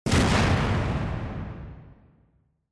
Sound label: Explosion Short